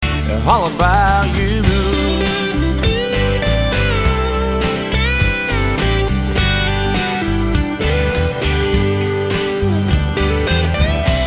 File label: Blues Stack Dyna Comp